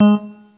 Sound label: Synth